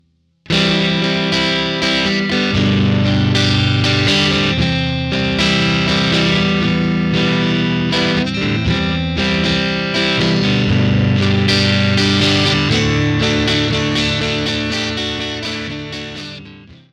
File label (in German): Git Clean; Steinberg UR24C